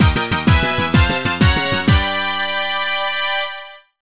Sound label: Jingle Results; Harvest Moon: Frantic Farming; NDS